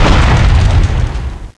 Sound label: fire, weapons